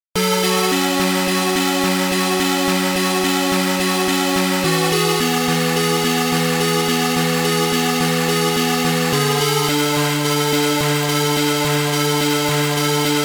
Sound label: Remix HighScore; Loopz